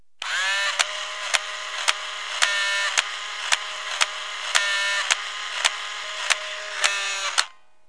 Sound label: REWIND; HOUSE; TRAVEL